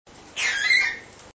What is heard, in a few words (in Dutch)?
protest, agaporniden; v 1 jr